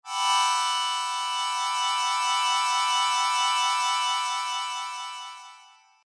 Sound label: Sho